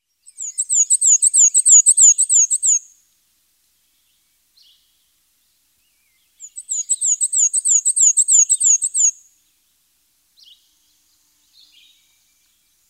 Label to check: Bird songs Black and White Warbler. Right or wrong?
Right